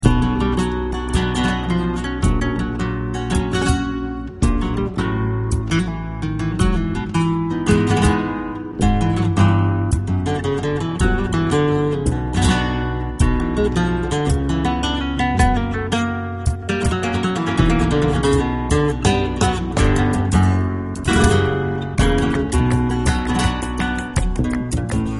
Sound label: el puerto, gitarre flamenco